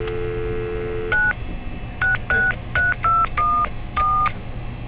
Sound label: dtmf sound